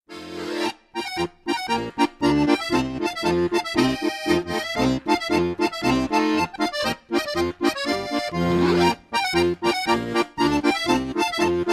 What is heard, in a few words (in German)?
Zillertaler Hochzeitsmarsch